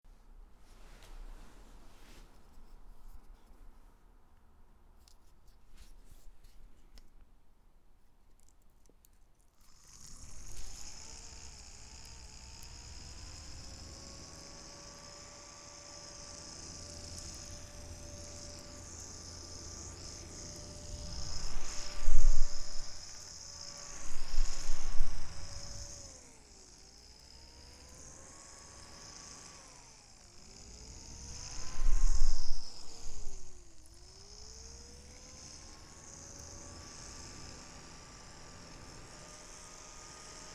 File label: Fan Noise